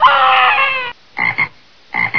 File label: cry frog